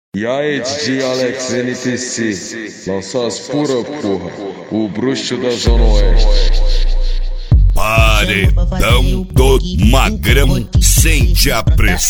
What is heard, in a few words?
phonk; antidepressivo; cd paredao do magrao 2023